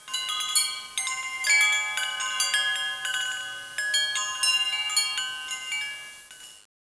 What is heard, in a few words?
chimes